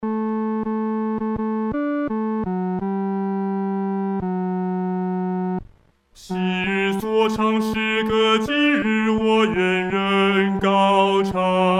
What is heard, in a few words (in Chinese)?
独唱 第三声; 荣耀归神